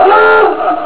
OLD HORN

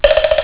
RUNNING

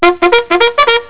BUGLE

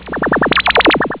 SCANNER

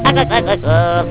POP LAUGH

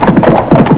GALLOP

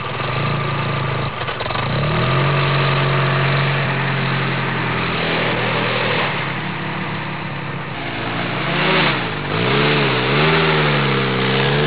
JEEP

